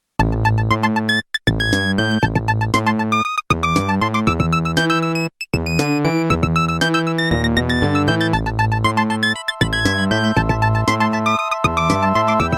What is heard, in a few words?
Nokia 3220 Ringtone; Espionage